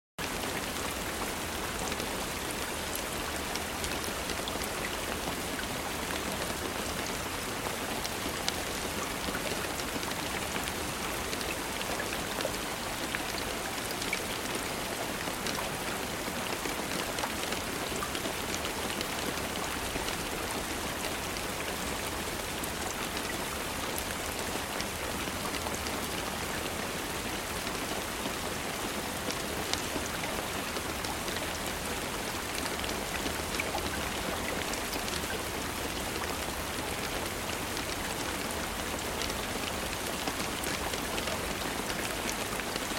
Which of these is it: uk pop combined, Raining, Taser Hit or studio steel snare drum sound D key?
Raining